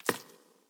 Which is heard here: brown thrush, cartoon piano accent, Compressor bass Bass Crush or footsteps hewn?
footsteps hewn